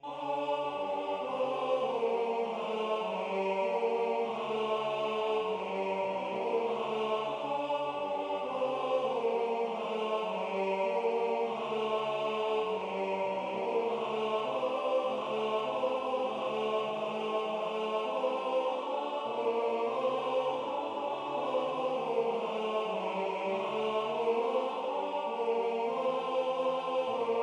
Guggisberg Tenor

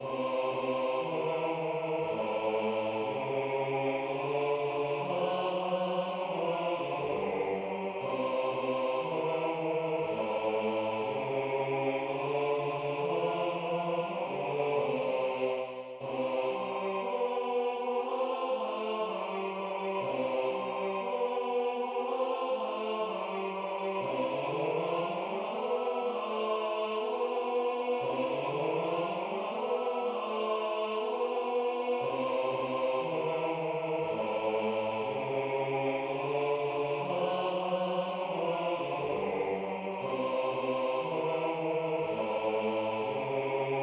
Samba bernoise Bass

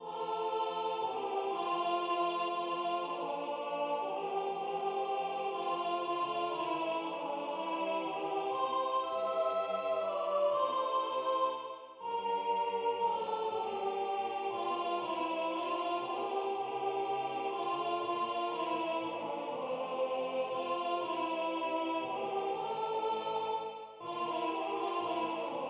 Sopran; Lied an den Mai Version 1

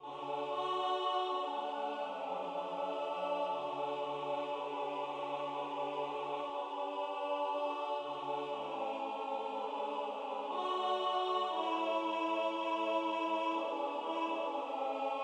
Tenor; Im Wald (Mendelssohn)

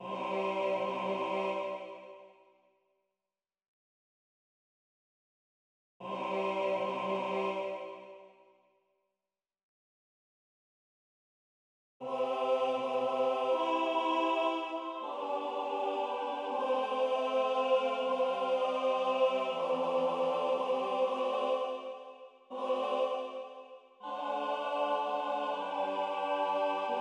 Gloria mit Soli Tenor

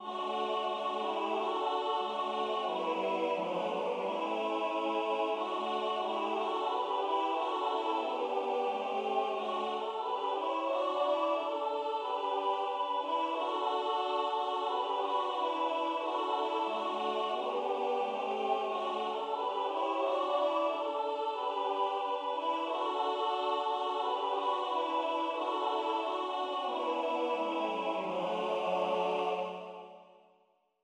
Gfunde Tenor

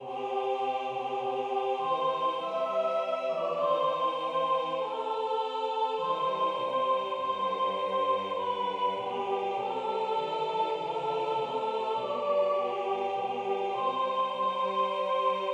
Hebe deine Augen auf Sopran

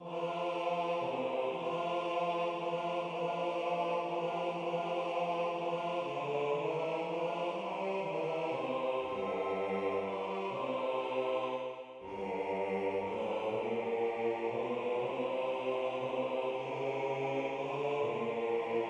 Lied an den Mai Bass; Lied an den Mai Version 1

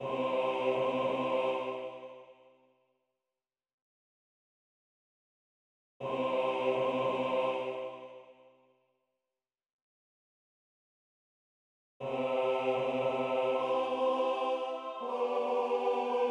Gloria mit Soli Bass

